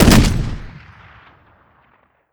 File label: weap fire plr, weapons; xm109; gmod; xmike109